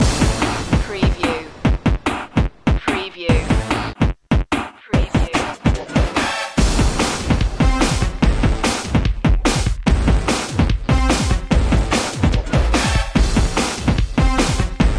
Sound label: goliath funk